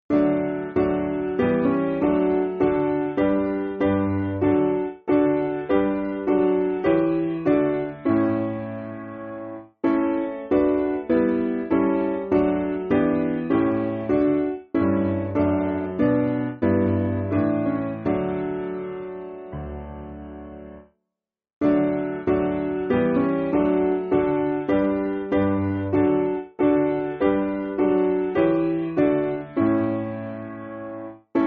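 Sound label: Piano, Music Backing Files for Churches; Father Of Jesus Christ; Solomon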